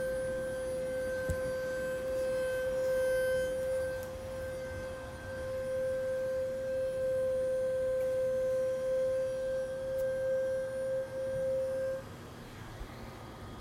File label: sound when rdx sits idling; 3g rdx